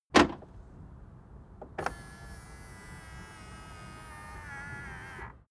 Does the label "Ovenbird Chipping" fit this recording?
No